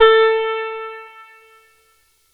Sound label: Lead Synth